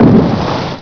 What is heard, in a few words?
liquid blast; weapons